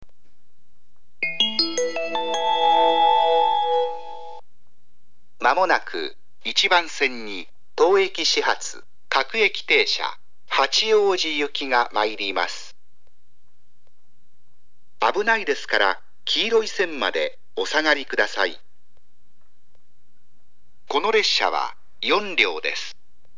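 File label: departure local; station; jr-east; minami-furuya; hachioji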